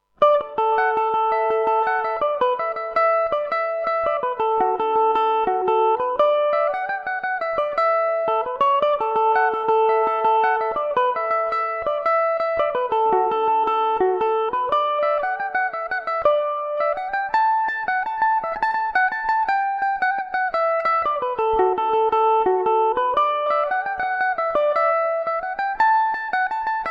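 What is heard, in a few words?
D major revelation, irish mandolin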